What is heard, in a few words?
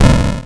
explosion; TheStable; Arcade; HeliCave